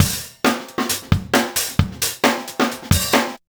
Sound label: drums, Hardcore Breakbeat, 134; Big Fish Audio; Hardcore Breakbeat Action; Mellow Jack